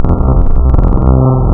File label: VIRUS SAW, wavetables